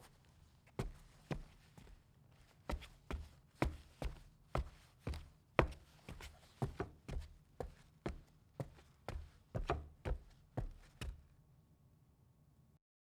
tile

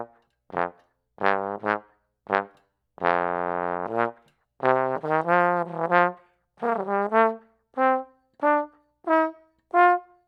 Trombone; EVDS35

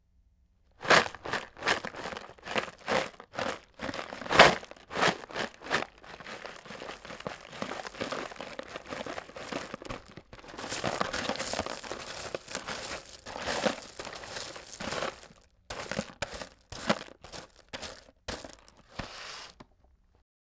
Box of Rocks

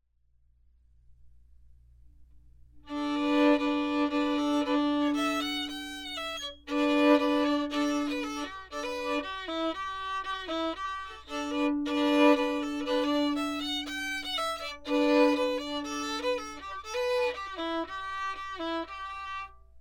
Vocal booth Violin

